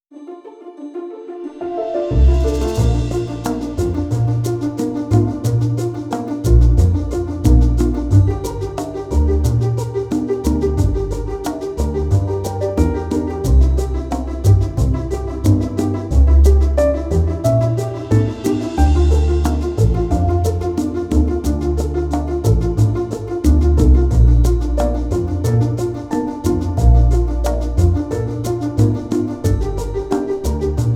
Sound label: sweetness pizz; by burak sound bank; cheerful vol 3